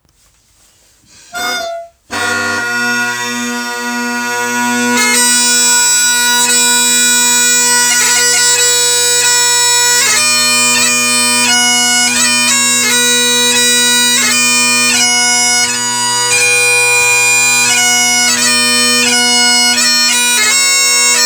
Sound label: Bagpipes; John Ban Mackenzie